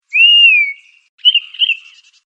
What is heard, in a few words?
Flycatcher